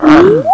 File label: Disp Awake; Crystal Crazy Demo; Casady & Greene